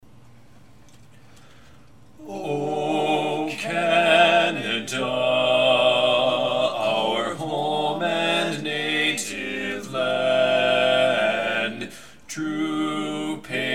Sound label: o canada bass dominant